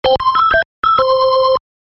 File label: organ